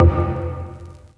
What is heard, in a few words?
Minimal Perc; Minimal Percussion